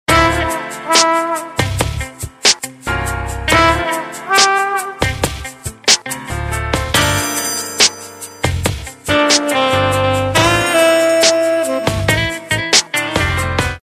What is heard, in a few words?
Ringtone, Samsung GT-C3510; Corby Pop Genova